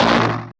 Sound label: Stab; neuro; Optiv & BTK